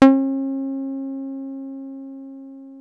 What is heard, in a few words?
303 C4, TB 303; Hollywood Edge; Giorgio Moroder Rare Synthesizer Collection